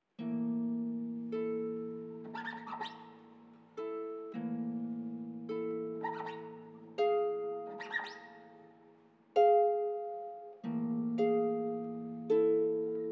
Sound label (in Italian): Arpa